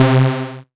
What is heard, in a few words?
C Synth, One Shots